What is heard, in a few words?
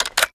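weapon foley tracker open; Call of Duty Modern Warfare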